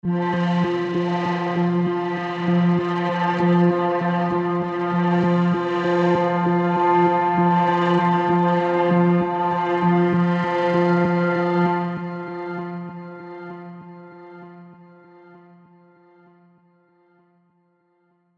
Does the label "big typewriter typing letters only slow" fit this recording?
No